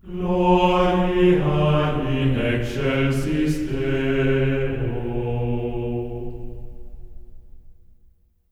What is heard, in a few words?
GLORIA B3, Symphony of Voices; Spectrasonics; GR GLORIA